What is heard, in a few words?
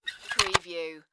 Cassette Case Close